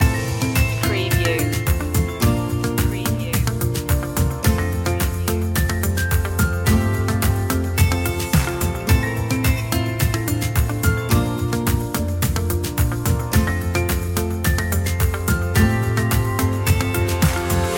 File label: taking flight loop